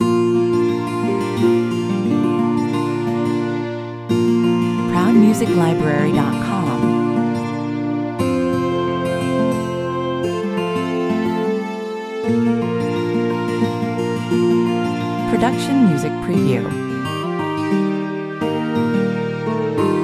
silent night intimate version, the Christmas classic as a relaxed version; Silent night, holy night - the Christmas classic as a relaxed version featuring a fingerpicked 12 string guitar, strings, flute and harp.